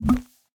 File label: paperlauncher paper gun